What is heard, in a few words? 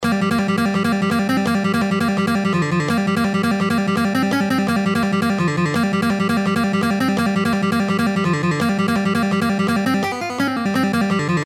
Guitar Dynamics; Another one from John Petrucci..